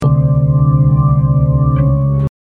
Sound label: creepy organ